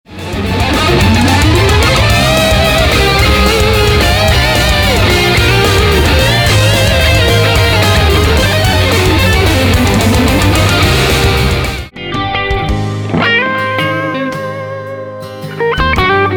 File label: Electric